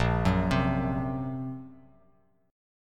A sharp Suspended 2nd Sharp 5th A sharp, Bass Standard; A#sus2#5 arpeggiated; JGuitar